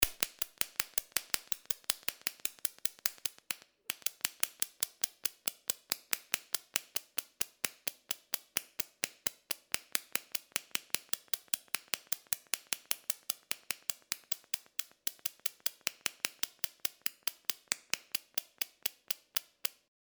sparks

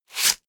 scratch

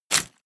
step armor halfplate

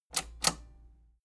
metal button robust spring click disable slow

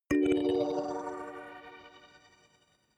notification kalimba magical major soft

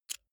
lighter wheel spin very soft

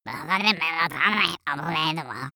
goblin sputter

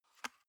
paper box small open medium

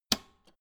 old button press jump out

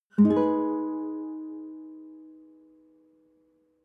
nylon guitar chord high minor soft

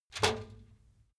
trash basket metal hit rattle high

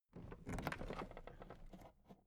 toolbox raise soft

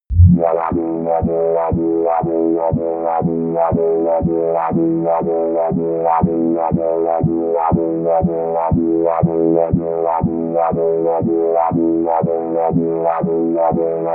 wobbling bass

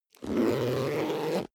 zipper backpack close

